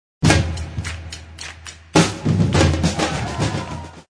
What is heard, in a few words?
Reggae Break